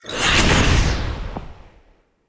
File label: Staff Cast Short; Weapons; Enemy; Staves; Mnd Wazard Staff